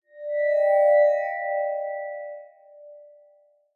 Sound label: fantasy magic mysterious portal swell open